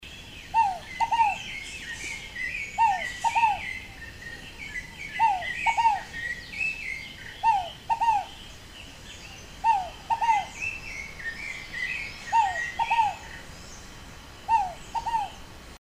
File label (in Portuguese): fogo apagou, aves